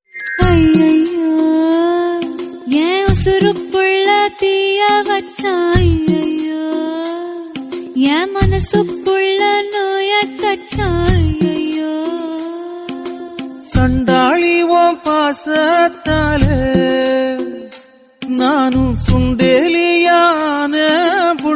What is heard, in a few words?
love song tamil